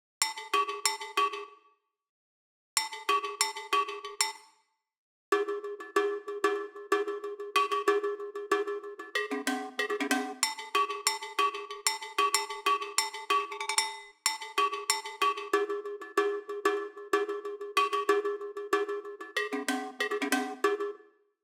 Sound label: reggae